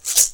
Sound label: MULTI RATTLE; Best Service; World Colours